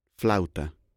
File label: musical instruments